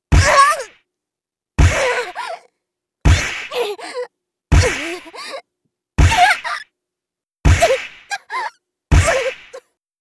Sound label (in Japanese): ダメージ声(中), 効果音あり